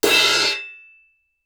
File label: crash choke crash, Suspended Cymbals; Percussion